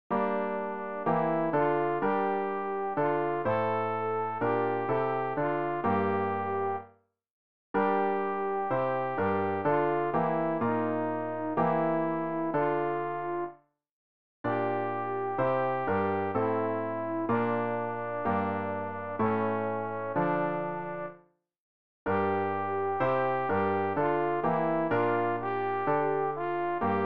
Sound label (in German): alt, Übehilfen für das Erlernen von Liedern; ref gesangbuch; rg 204 wenn wir in hoechsten noeten sind